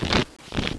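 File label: shuffle, cards; MacAddict; Eric's Solitaire Sample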